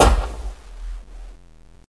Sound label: foot light, antlion guard; hl2